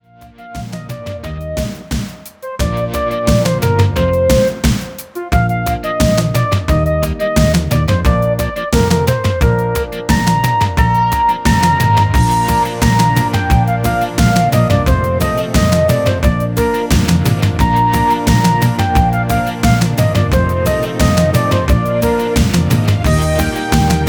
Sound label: Red Indian Drums, Genos 2